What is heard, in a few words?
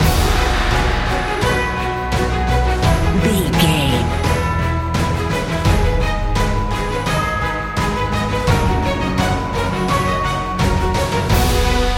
cinematic corporate loop